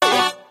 Battle; Kairobotica

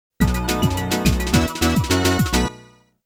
Round Clear; Mappy Arrangement; Namco Classic Collection Vol.1; arcade

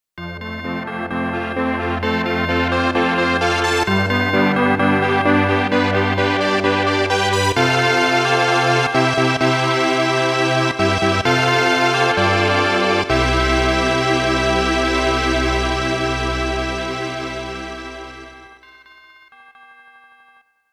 Wedding Bell; Estpolis Denki I II Super ROM Cassette Disc in Taito Vol. 1

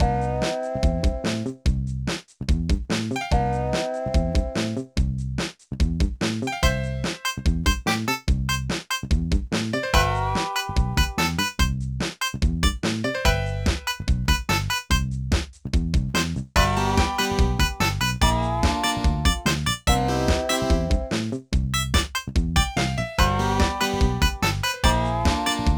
Music Western

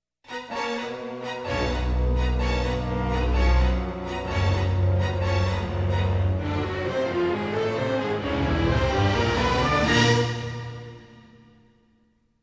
suspence short; Chrome

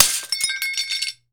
plate glass break

